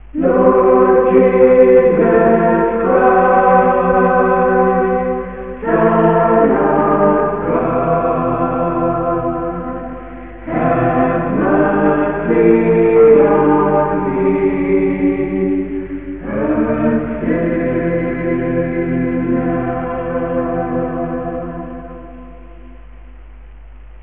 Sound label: jesus prayer, Chants